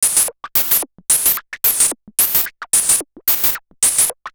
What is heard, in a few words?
MiniBrute 110BPM, Gated Seq Noise